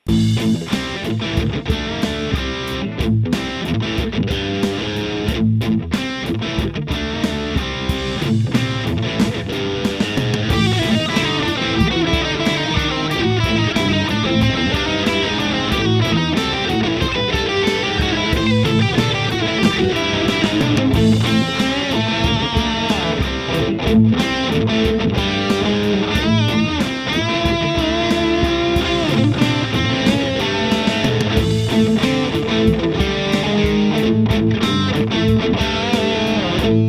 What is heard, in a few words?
Mooer Prime P2 Hard Rock